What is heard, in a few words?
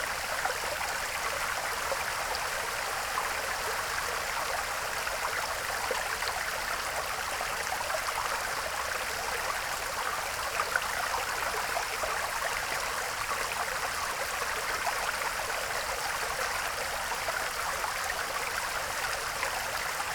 Continuous Water Flow; WATR Flow